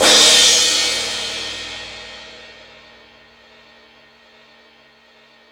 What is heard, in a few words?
Sluggo Drums, E-MU Pro-Cussion